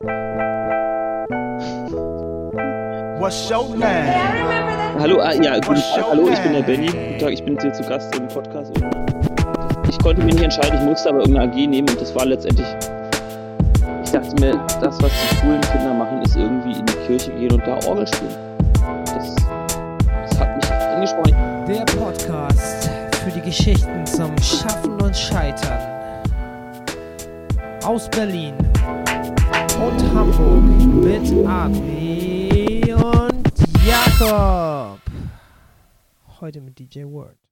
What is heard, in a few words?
sunshine jingle